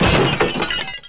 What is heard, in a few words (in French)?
vitrine